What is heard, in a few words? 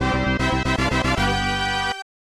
Upgrade; battle commander; snes